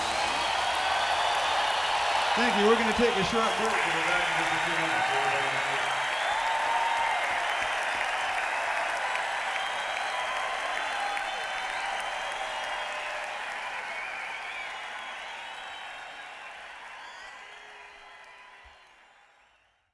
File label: Tuning